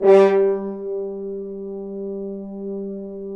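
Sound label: brass, FHns Accent; Roland L-CD702; Accent-Swell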